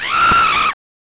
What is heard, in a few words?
Voice SHREEK; Earthworm Jim 1; Genesis